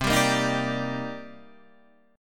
Eb Major 7th C; Ebmaj7/C chord; JGuitar

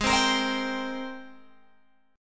G sharp Major 9th G sharp, Guitar Open G

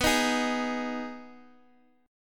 B 6th B down Guitar Open D

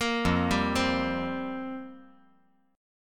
F Suspended 2nd Suspended 4th F down

